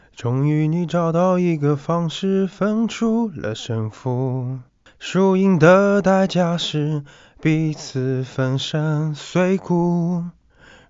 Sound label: haha; NeuCoSVC